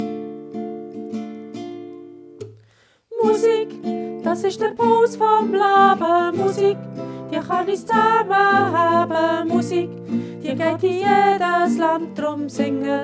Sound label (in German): erste Stimme; Musig das isch dr Puls vom Laebe; Attisholz